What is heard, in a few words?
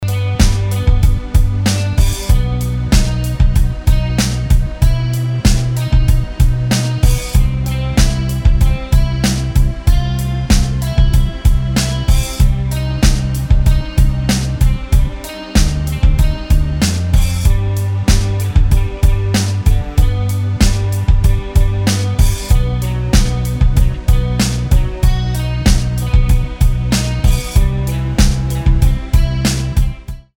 Karaoke Song